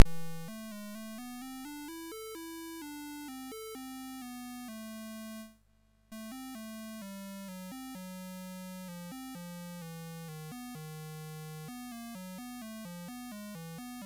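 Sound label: Mountain King, MOS 6581 SID chip music, games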